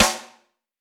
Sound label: long acoustic snare F sharp key, Royality free acoustic snare sound tuned to the F# note